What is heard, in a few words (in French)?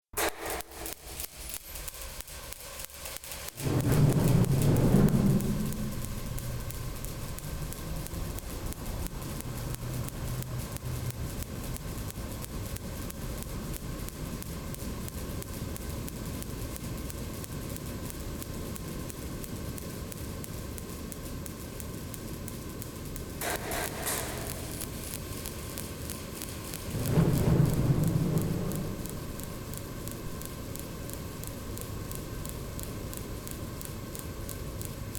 chauffage